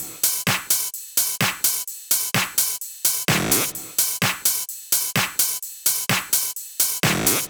128BPM Air Raid Kit

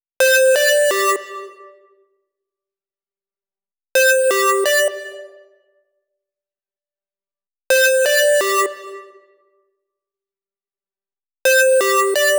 Female Sequence, 128 BPM; Vengeance Samples; Vocal-Hook Kits; Song Kit; Freaky Boys

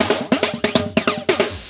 Loops Fills; Vengeance Samples

